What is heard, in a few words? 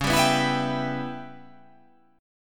C sharp Minor 7th Flat 5th C sharp